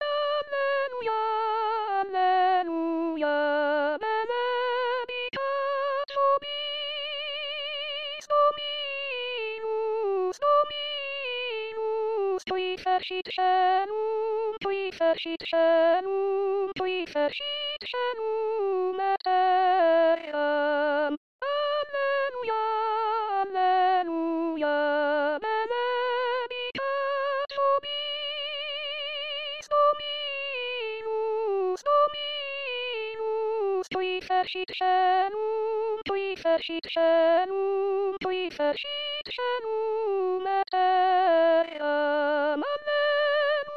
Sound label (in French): soprano